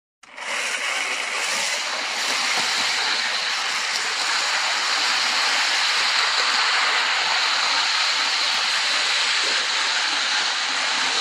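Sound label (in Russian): poezd edet po relsam igrushka, toy train running on rails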